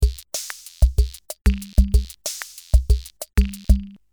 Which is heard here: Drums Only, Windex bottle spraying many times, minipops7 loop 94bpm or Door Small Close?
minipops7 loop 94bpm